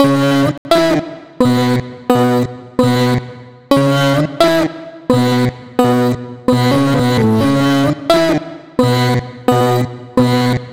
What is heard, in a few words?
vocal chop, 130, C#m; Slate Digital Omnivox Vocal Sample Pack; Chops